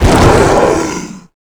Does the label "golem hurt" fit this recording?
Yes